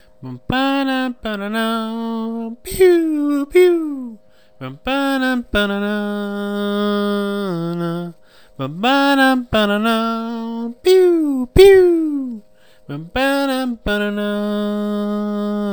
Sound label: mystery song